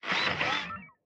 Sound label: robot repair; factorio 2.0.55